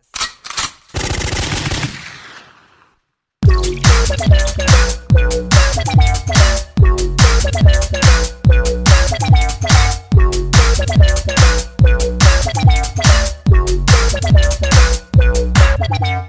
Quick Baseline

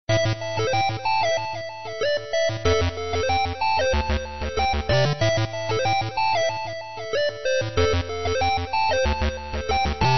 Ding Dang

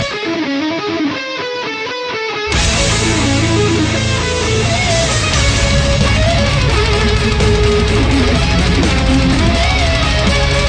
Solo

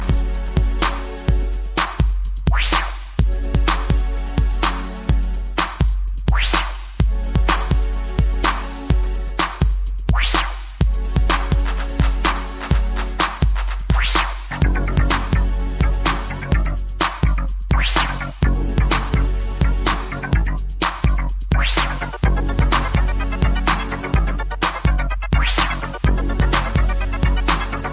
Old Skool Beat

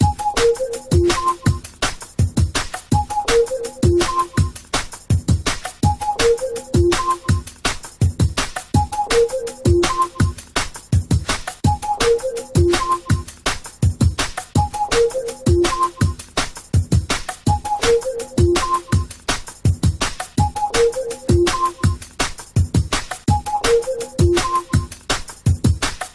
Bipbopbupbopbupbip

